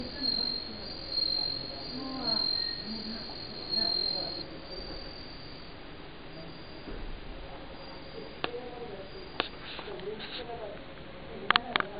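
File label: suzumusi, bell cricket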